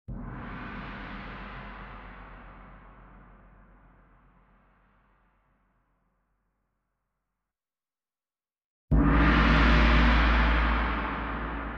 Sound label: Tamtam